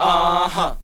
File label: VOX SUNG; Megabass Remix! 2